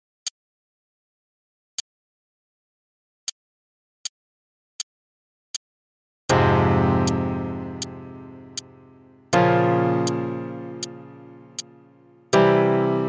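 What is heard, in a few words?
Primary and Secondary Chords in f minor only (half note=80), f harmonic minor, Primary first, then secondary, root position; CM Level 5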